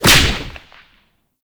Whip Crack Small; melee; weapons; Oraxia

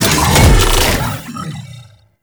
Bowl Stop; Warframe; Grendel; Grendel Deluxe

